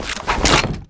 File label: mg equip